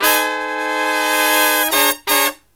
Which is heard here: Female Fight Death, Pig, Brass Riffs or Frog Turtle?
Brass Riffs